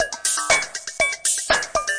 countdown to robotocide, drum loops and breaks, 120 bpm